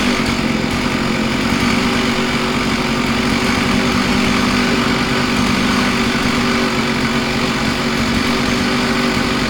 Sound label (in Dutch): zaag stationair